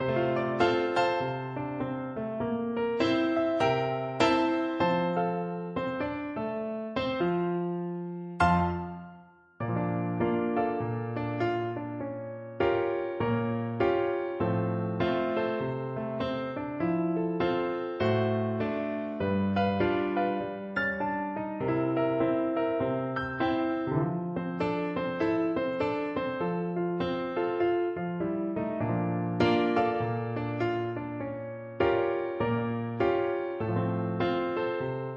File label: flute